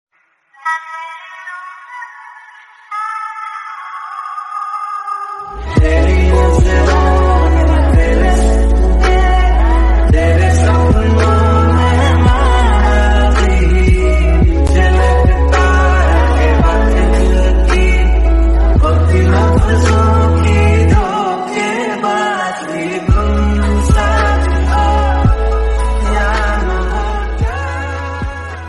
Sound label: hindi romantic remix slow motion song; tamasha